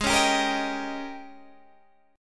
G sharp Major 7th Suspended 2nd Suspended 4th, Guitar, Standard; strummed; chord; 16,16,17,15,16,15